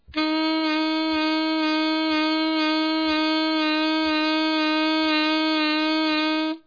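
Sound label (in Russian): саксофон одиночный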